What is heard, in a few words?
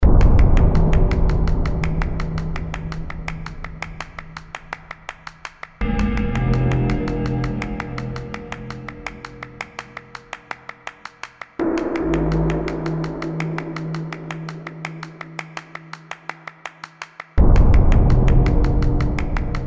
THE CLAPPER (83bpm)